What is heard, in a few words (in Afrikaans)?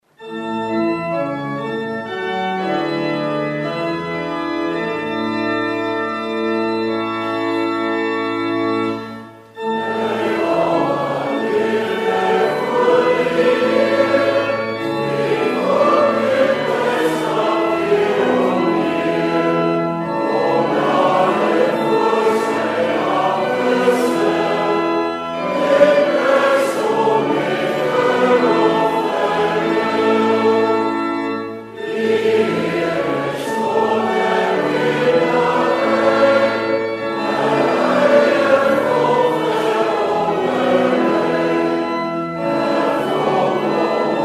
Gemeentesang; Ps 100-1 verse 1,2,3,4